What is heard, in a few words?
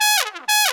TRUMPE, Trumpet FX; Roland - LA Composer vol. 2